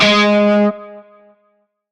Shawzin Oct Two G Sharp; Music; Warframe; Narmer Shawzin

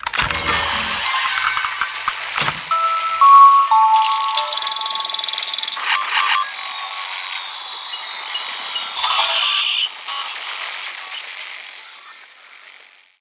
Terminal Interact; Ambience; Gameplay; Warframe; Nineteen Ninety Nine

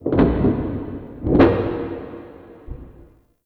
Freaky Taps; Ambience; Warframe; Shadowgrapher

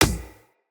Beach Ball Kick; WaterFight; Beach Ball Floof

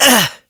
Generic Short Pain, Operator Voice; Operator Mission

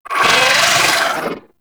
Vocal Death; Warframe; Moa Satyr

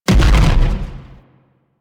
Railgun Explosion; Warframe; Corpus; Corpus Railjack; Biped Railgun

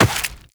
Step Rock, Footsteps, Walk; Warframe; Tenno

